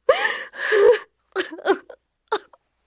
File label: crying; svencoop